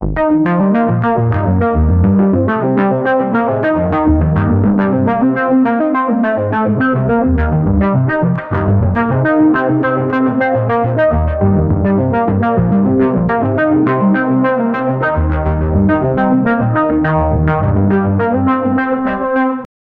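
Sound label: looped analogue bass synth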